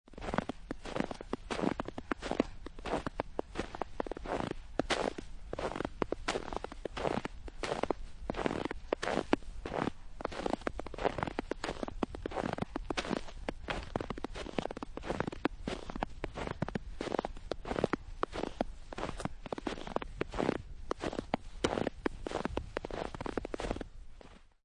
Snow And Ice Footsteps Snow Dry; Weather

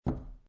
Poker Chips One Chip Dropping On Felt; Gambling Sound Effects; Casinos

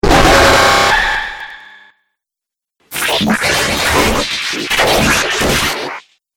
Dubstep Scifi Effects

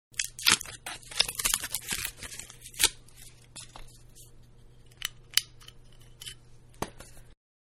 Champagne Unwrap Foil; Bars; Champagne-Unwrapping Foil; Manhattan Production Music

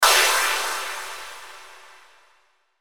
Hit Burst Shimmer Phaser; Production Element Imaging Element Accent Transition

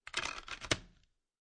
Foley, CD Placed In Jewel Case; Set Downs; Objects

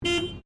Car Toyota Corolla Horn Short Exterior; 2009 Toyota Corolla hatchback; Cars; Vehicles

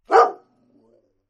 Dog Barks; Dogs; Animals; Partners In Rhyme

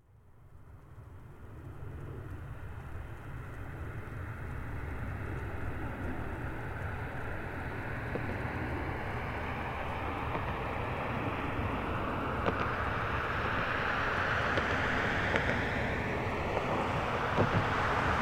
Car Highlander Up Stop Med; 2005 Toyota Highlander V6; Cars; Vehicles; Big Room Sound

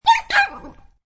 Small Dog Yelp, Small dog yelps loudly; Small Dog Bark; Dog Sound Effects; Animals

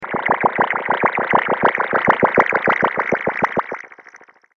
Mad Scientist Liquid Experiment, scary soundscape; Scary Soundscape Sound Effects; horror sound effect

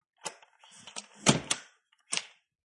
Aluminium Window Close And Lock; Window Sound Effects; Windows (Sound Effects:Household)

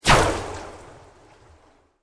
Sweep Organic Fast

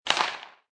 Sports Hockey Puck Hit Ice Rink; Hockey (Sound Effects:Sports)

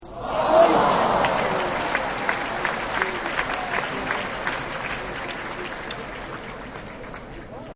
Applause Disappointment; Human; Partners In Rhyme

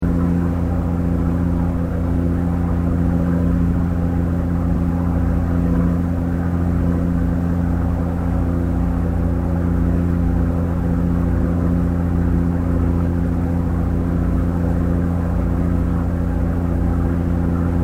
Boat Tourist Ferry Moving Medium Loop; Boats (Sound Effects:Vehicles)

